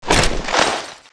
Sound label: chainsaw attack end, weapons